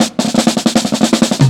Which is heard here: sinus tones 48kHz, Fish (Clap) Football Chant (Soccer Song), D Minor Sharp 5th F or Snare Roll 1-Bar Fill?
Snare Roll 1-Bar Fill